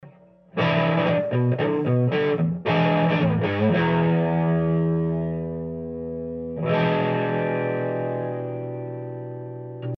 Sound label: amp with stand; Goodwill